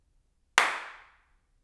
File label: one clap